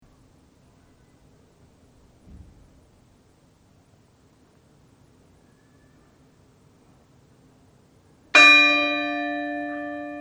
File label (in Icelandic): stykkisholmskirkja likhringing, kirkjuklukkur